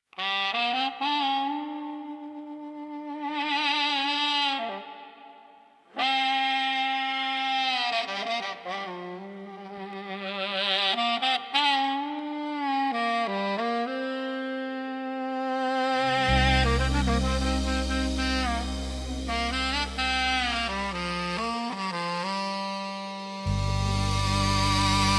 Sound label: ukrainian beat, trembita